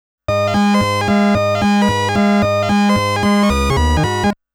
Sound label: Game Start Music; Namco Classic Collection Vol.2; arcade soundtrack